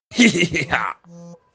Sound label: hehe ha